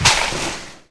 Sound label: Ball Hit Net